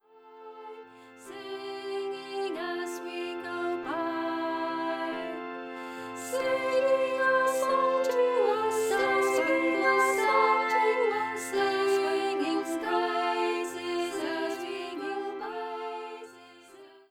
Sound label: Singing Our Song round; The Nosey Neighbour